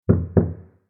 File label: knock